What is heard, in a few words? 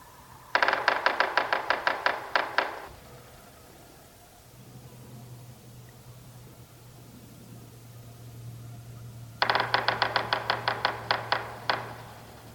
yellow bellied sapsucker drumming